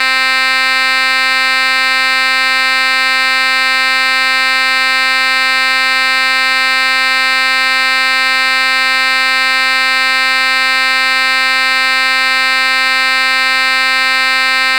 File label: Ring bell; 音MAD; 蓝蓝路